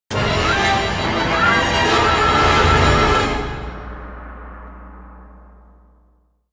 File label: Scary Event, music; Mood Scary